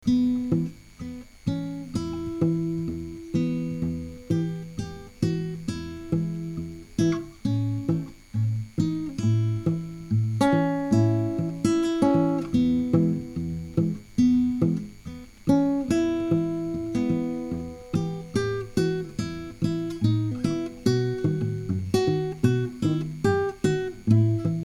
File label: Blues